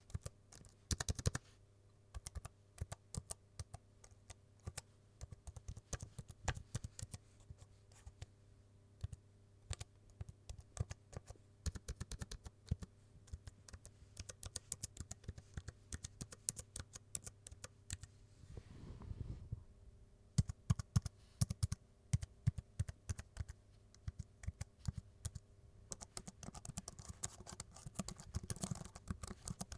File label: Keyboard